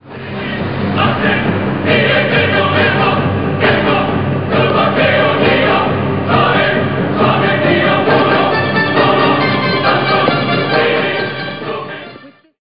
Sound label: chanting; Dahak